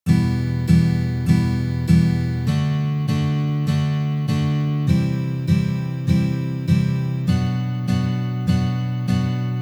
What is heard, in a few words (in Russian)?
Uprazhneniya dlya igry akkorda Mi minor na gitare